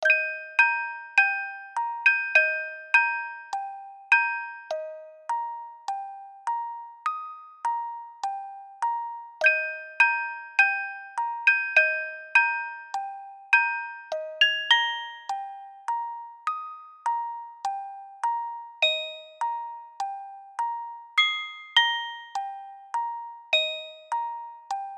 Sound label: Synth, Music Box; animal crossing new leaf welcome amiibo soundtrack